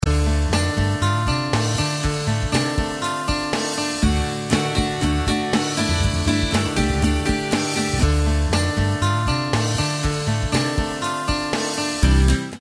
120 guitar

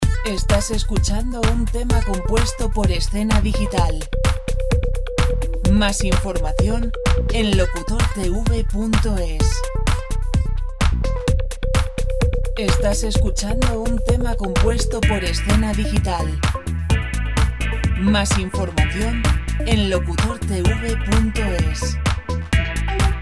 128 dance; Videogames dance music

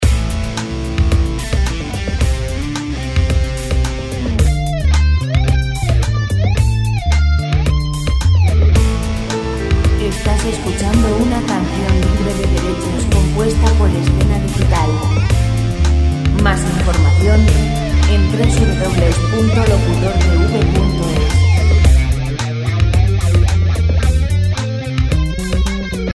110 rock; background music

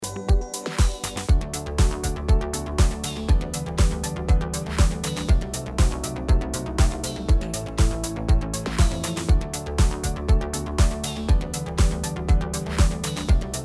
120 pop royalty free music; background music

